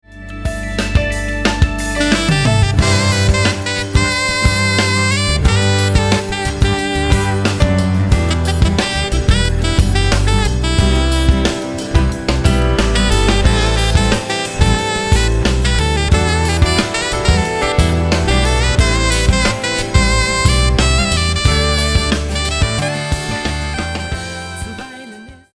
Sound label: sax solo; sterne